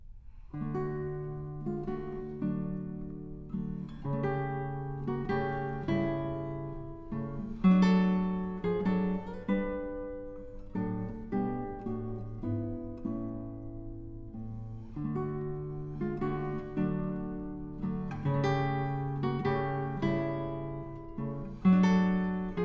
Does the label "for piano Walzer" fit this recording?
Yes